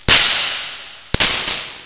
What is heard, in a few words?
STATIC, Electricity; sound effect